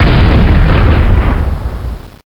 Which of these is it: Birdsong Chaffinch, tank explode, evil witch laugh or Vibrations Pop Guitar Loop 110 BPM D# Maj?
tank explode